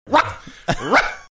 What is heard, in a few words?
barking